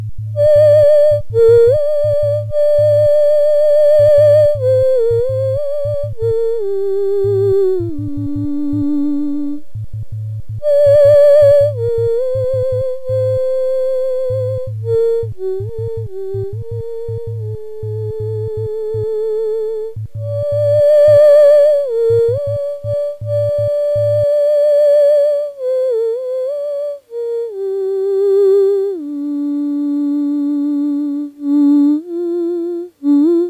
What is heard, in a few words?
theremin